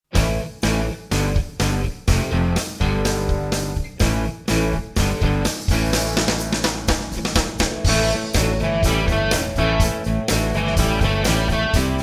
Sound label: Ballroom rock, drums play-along